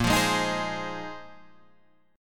A sharp 7th Suspended 2nd Suspended 4th, Guitar Standard chord; strummed; 6,8,6,8,9,8; JGuitar